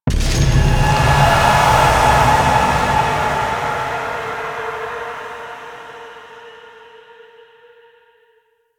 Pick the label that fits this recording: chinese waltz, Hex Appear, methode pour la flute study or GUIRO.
Hex Appear